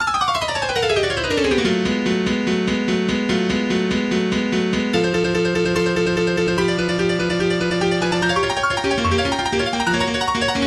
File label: Battle Music